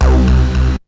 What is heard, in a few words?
BASS; SoundFactory; SMPMANIA